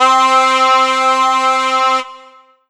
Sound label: Roland SC-88 Bagpipe C4